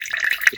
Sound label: vessel pour start; cooking; Votive 1.21.1; Votive Content